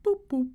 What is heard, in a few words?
UI Sounds Boop